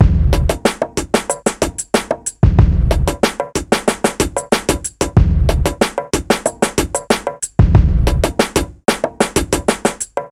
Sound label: Little Twang Wit It Bpm 93 Drum Loop